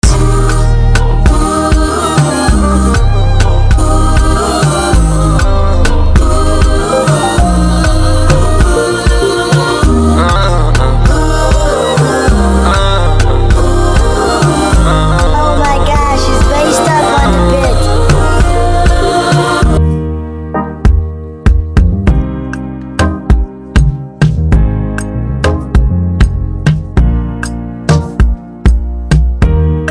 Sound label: Instrumental